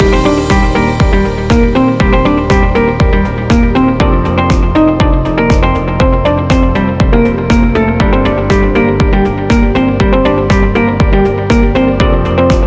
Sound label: Technology Loop; corporate interview